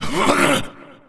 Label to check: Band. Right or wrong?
Wrong